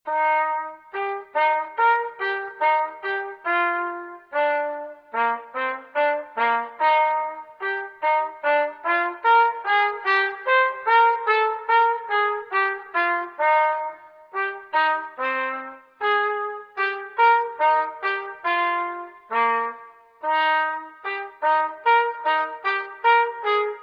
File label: trumpet; Arban